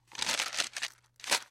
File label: Parchment Paper Rust